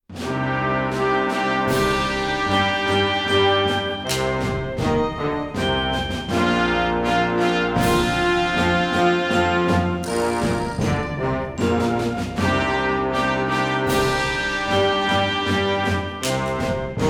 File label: sea shanty variations